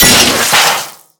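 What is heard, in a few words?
metal panel; Explosions; modern warfare 2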